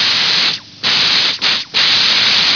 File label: spray paint